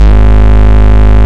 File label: Ultimate Bass G# Root G#; Vengeance Samples